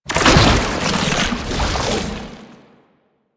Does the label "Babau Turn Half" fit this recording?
Yes